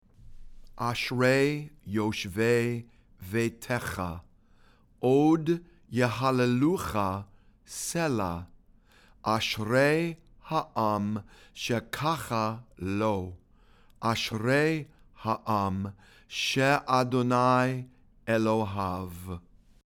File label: ashrei read and sung